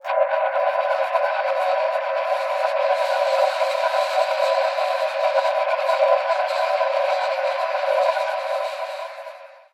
Trolley, Noisy; Zero-G Seismic Frequencies

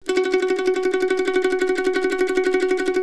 CEE TRILL F# R, Blues Guitar

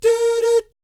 DOO DOOT, Vocal Planet Jazz; ILIO; Jazz & FX; JAZZCOMBO

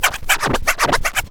scratch fills; SCRACTH FILLS; Megabass Remix! 2

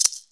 maracas; DRUMS & PERC

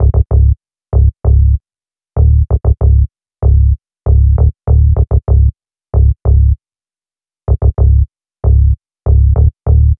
Bass, Hip-Hop

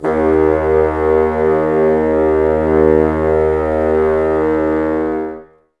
Bassoons D#2; Miroslav Vitous - Woodwind Brass Ensemble

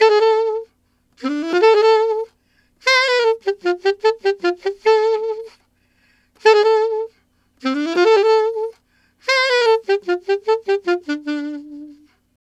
Alto Polka in D min, Alto Sax; Sonic Foundry (Sony Creative Software)

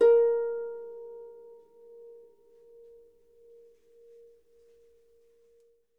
Harp A#4; Miroslav Vitous - Percussion & Harp

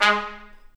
BRS TP, Roland, Stabs; Roland L-CDX-03

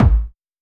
Kick, Drum Hits

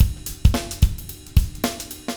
LOOP, 70's Breakbeats; USB Soundscan vol.46; AKAI

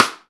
Rim, EMU PX-7 Drum Machines

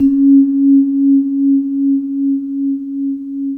VIBRAPHONE D; ILIO

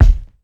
Loop Kick, Ensoniq ASR-X Pro

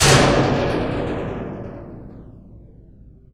METAL HIT; Rarefaction; Poke in The Ear With A Sharp Stick

